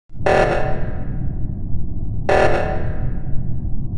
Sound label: alarm; among us